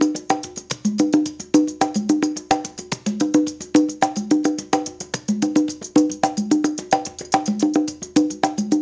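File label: 108 bpm, Classic Conga; Spectrasonics - Supreme Beats - World Dance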